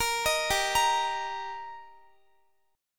G Minor Sharp 5th G Guitar, chord; Gm#5 arpeggiated; x,x,20,20,19,17

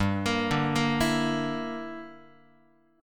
G Minor 6th, Guitar; Gm6 arpeggiated; JGuitar

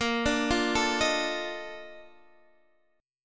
Bb Major 11th Bb down Guitar Half Step Down

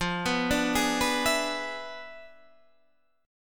E 7th F, guitar; E7/F; arpeggiated

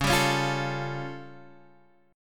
A Augmented 7th C sharp

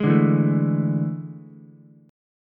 A Major 7th Suspended 4th, Ukulele; AM7sus4; strummed; JGuitar

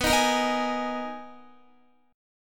B Major 13th B down Guitar; BM13; strummed; JGuitar

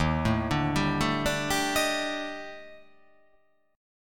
D sharp Major 7th Flat 5th D sharp, chord

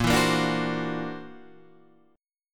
C 9th Flat 5th Bb

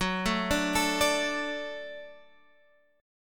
F sharp Minor Sharp 5th, Guitar Standard; arpeggiated; 14,12,12,14,15,x

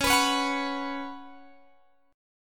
C sharp 7th Suspended 4th C sharp, Guitar Open G

